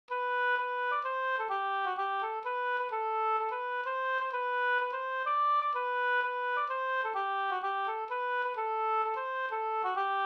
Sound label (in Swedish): hamburska